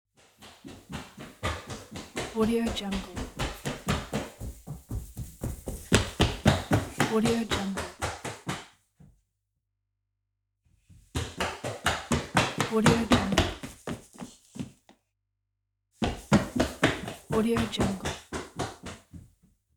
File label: toddler running in house; کودک نوپا